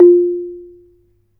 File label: RANAT THUN F4; Spectrasonics - Heart of Asia CD2